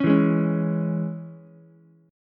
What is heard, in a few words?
C sharp Minor down Ukulele; C#m; strummed; JGuitar